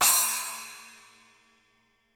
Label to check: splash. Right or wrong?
Right